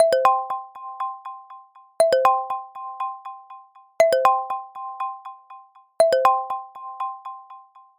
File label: incoming order